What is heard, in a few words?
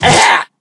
slide; Doctor Bone Cookie; cookierun